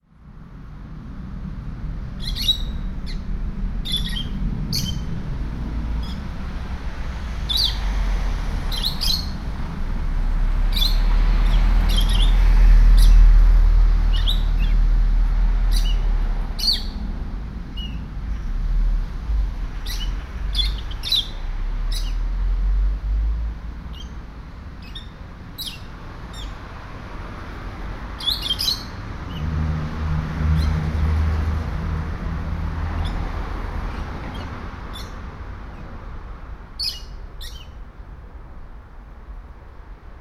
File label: parrot in window cage, sergiyev posad